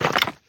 脚步声; 凋灵骷髅

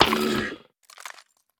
海龟死亡声

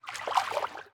海豚游泳声

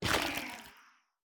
幽匿破坏声

